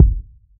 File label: warm sounding urban kick g key; kick drum samples